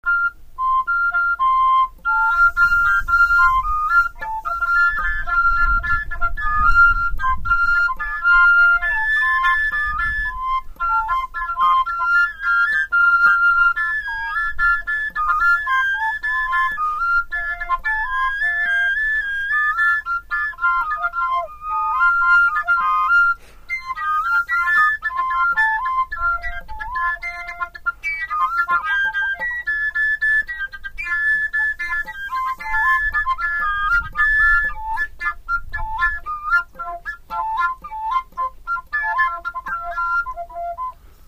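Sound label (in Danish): seljefløjte dobbelt; seljeflute dobbelt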